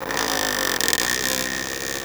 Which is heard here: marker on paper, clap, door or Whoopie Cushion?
door